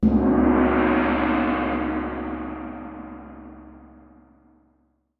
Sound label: solemnity